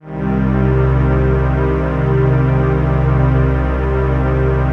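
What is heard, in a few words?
trance pad; Best Service; XX Large - Pads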